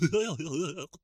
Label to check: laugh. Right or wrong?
Right